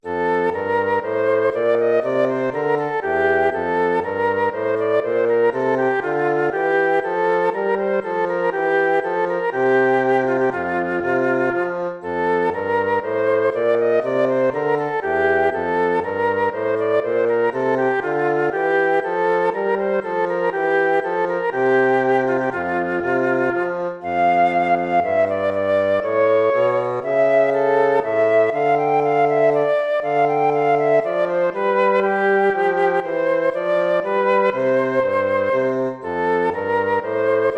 baroque minuet major

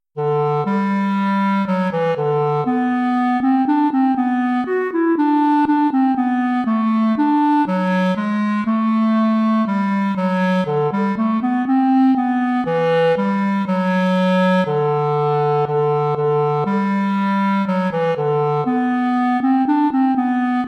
bourree; praetorius michael

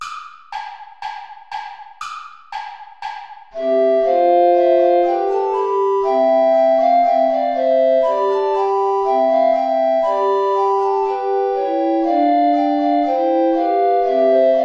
bourra play along without soprano recorder; praetorius michael

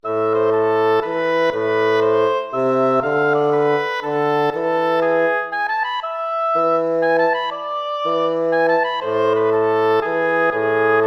baroque minuet minor

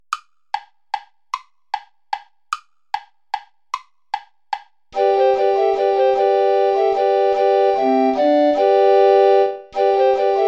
volta play along without soprano recorder; praetorius michael

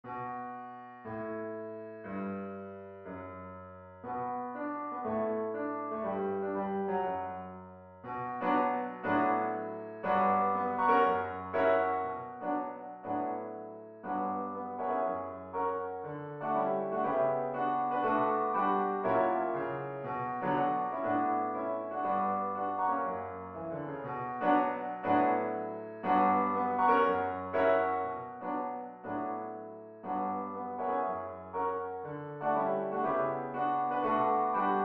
swing

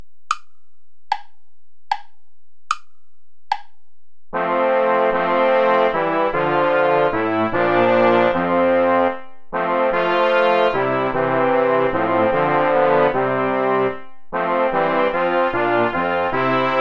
play along without trumpet

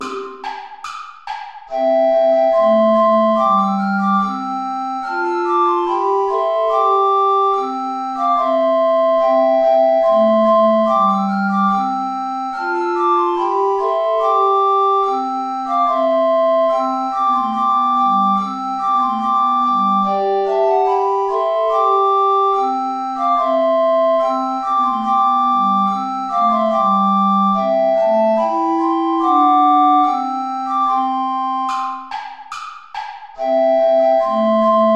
bransle play along without tenor recorder; praetorius michael royne

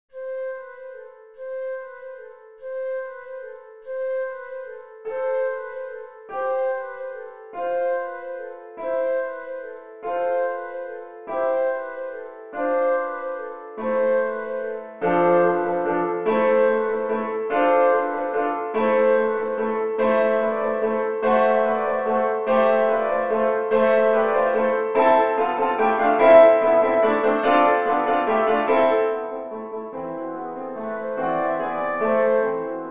carols of the bells; leontovich mykola

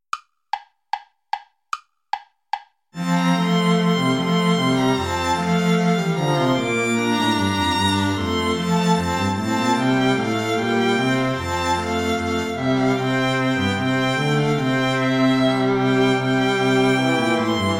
bourree play along without violin; praetorius michael; bourree iii

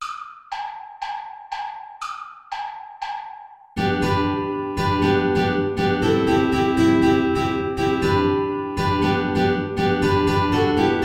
play along without guitar; traditional

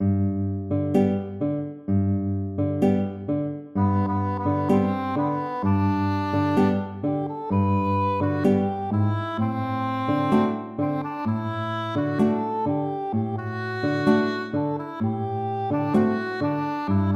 tango

